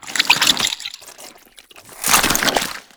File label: Proto Infested Ancient Taunt Flesh; Warframe; Abilities